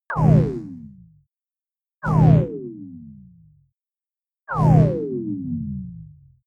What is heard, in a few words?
turn on off